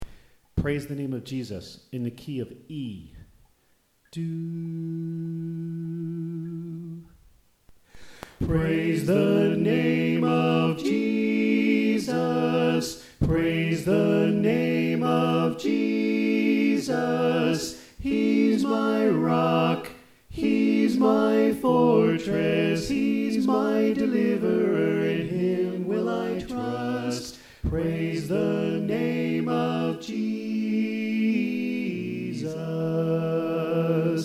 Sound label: Tenor Left